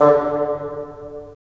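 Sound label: wind bassoon; LA Composer vol. 3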